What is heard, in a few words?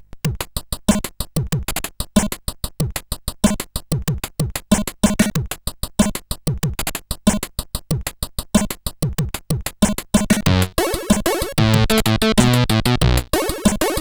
Dub, CSG8580R5